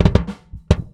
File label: Doublekick Loop; Airwindows